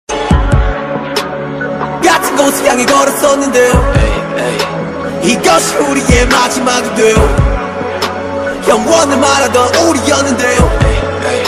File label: korean ringtone